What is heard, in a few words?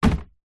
household bin small plastic flip lid close; zapsplat